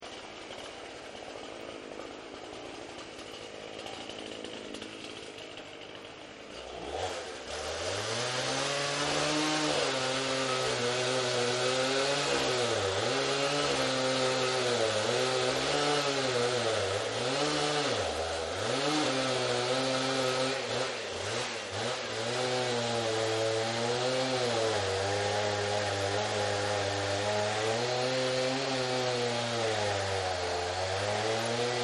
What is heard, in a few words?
Chainsaw Forest Loop; Saws; Nature